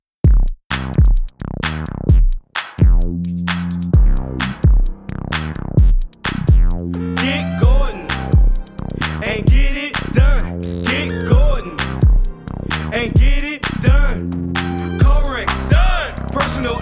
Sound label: Jingle